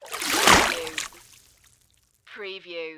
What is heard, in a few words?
Splash Canoe Paddle